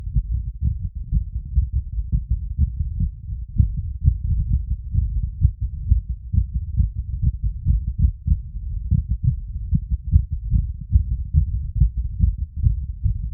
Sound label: one VES to see VENTRICULAR EXTRASYSTOLE, Heartsound, Arrythmia; Heartsound Library